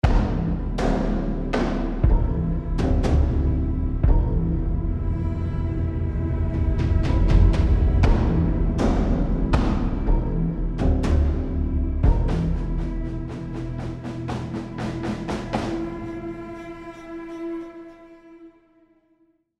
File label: Tension; AB Tension 3 High Chunk 6